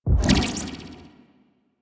Throwable Coolant Item Aim; Venus; Warframe; Ambience; Venus Open World

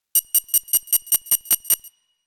Alt Fire Beep; Weapons; Heavy Shotgun; Corinth Prime; Warframe; Tenno

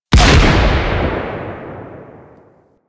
Four Barrel Rifle Shot

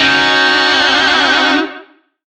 Shawzin Yo Chord L; Music; DE; Warframe; Lizzie Shawzin

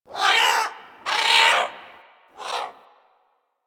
Bird Of Prey Vocal Idle D; Warframe

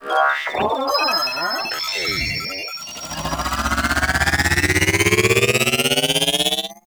Drone Restore Health; Warframe; Nokko Colony; Drone Defense

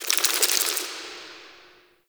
Under City Insect Wet Scurry

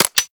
Laser Aim Rifle Alt Mech; Warframe; Weapons; Grineer; Grn Laser Aim Rifle

